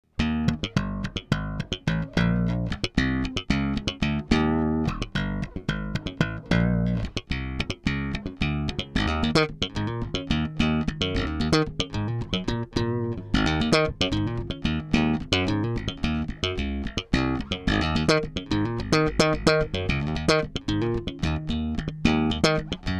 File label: EBS DI SKC Funky